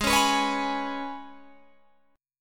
G sharp Minor Double Flat 5th G sharp, Guitar Open G; G#mbb5 strummed